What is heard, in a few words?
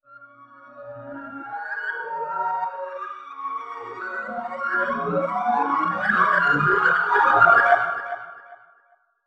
Reverse Accelerating Crescendo Echo